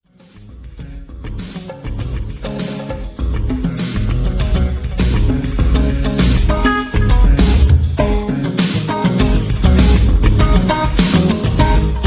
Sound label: drum solo play